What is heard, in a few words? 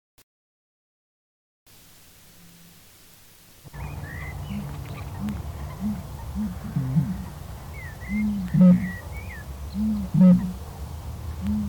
Bittern; Harry